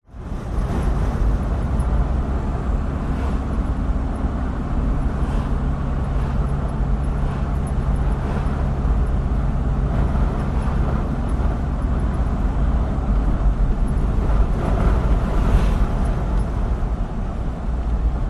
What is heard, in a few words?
vehicles car nissan patrol 1998 4x4 diesel drive 60kph windows open; zapsplat